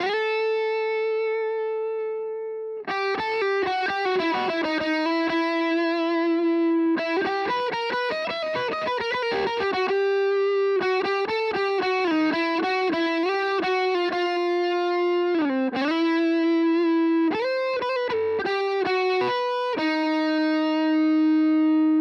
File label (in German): MH 1000 Zerre; ESP LTD Deluxe